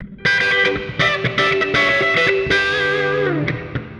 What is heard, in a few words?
Guitar wet